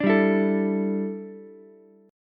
E Minor 11th Ukulele chord, C6; Em11 strummed; JGuitar

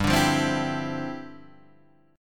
G 13th Suspended 4th, G13sus chord; G13sus strummed

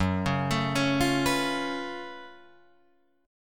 Gb Minor Major 11th Gb down Guitar Drop D

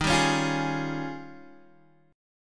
D sharp 11th, Guitar, DADGAD

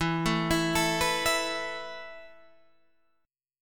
E Suspended 4th E, down, Guitar, Half Step Down

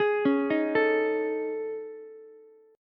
A Major 7th Flat 5th, Ukulele; AM7b5 arpeggiated; JGuitar

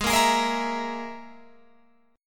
Gsharp Suspended 2nd Sharp 5th, Guitar, Open F; strummed; 20,17,20,17,19,17

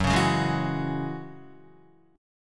Gb 7th Suspended 2nd Gb down Guitar; Gb7sus2 strummed

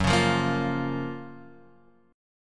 Gb Major 7th, down, Guitar, Open F; GbM7 strummed; JGuitar

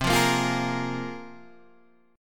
C 7th Suspended 4th Sharp 5th, Guitar, DADGAD

